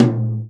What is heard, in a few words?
tom, Real Drum Kit, jungle kit